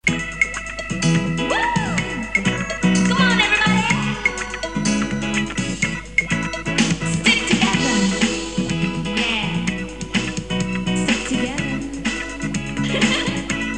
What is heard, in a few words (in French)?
musique chanson